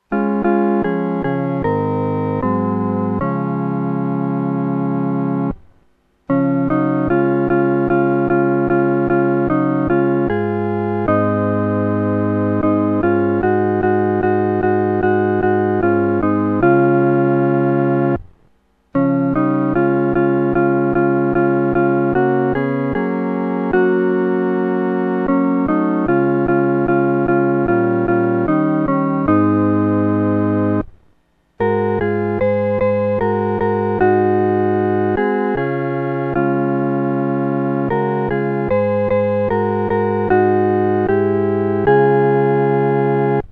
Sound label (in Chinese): 合奏 四声部